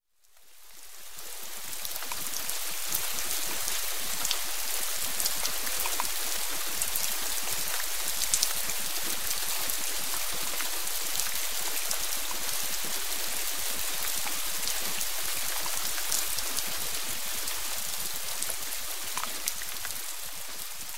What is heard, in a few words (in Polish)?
padający deszcz; Grudniowe Opowieści